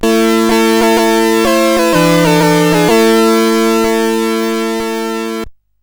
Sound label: sid CSG8580R5; Fiendish Freddys Big Top O Fun